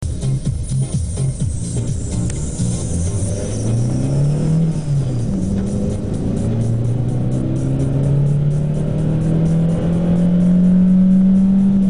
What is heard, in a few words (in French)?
moteur 307 110